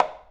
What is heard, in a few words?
move